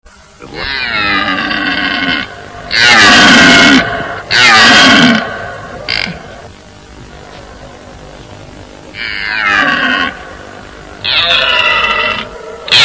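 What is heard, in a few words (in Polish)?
jeleń, red deer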